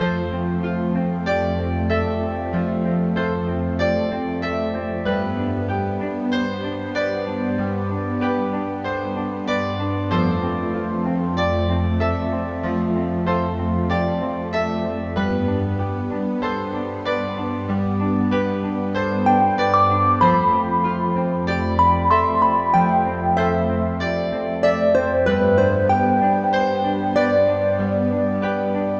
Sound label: Background Music; Udemy Card Battler